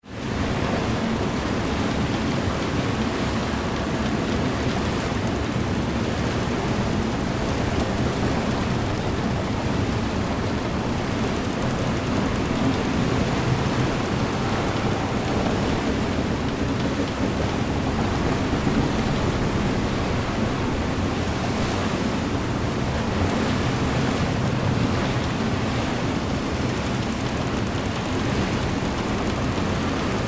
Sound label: Inside Ship